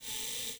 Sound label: borg walk, footsteps; Effects